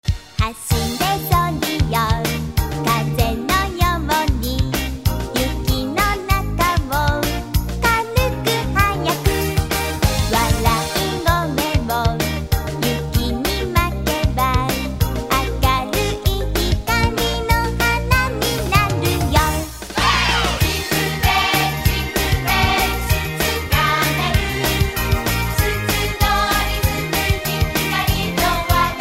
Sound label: japan xmas song